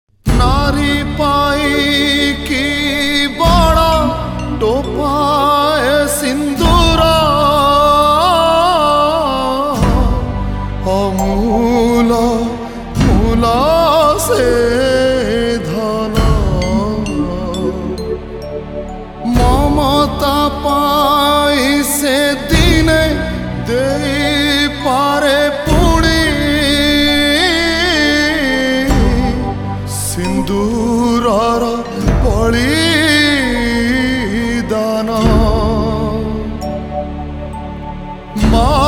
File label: sad version